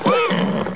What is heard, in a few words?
nicker